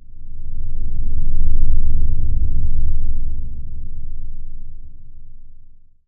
breath, underground

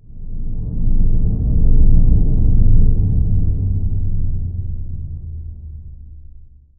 breath, underground; ambient

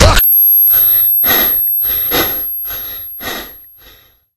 hit fist; affects

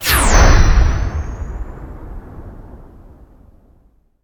teleport work, anomaly

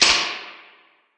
switch

